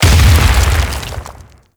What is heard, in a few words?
Barrier Collapse; Warframe; Brawler